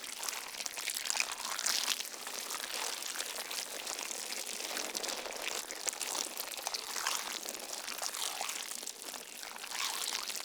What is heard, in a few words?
Infested Gore Loop Fleshy Medium; Ambience; Props; Warframe; Infested Eris